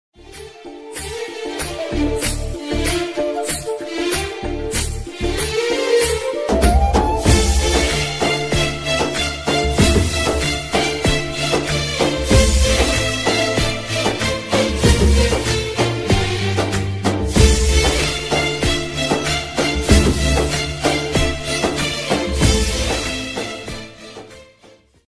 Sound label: bengali love ringtone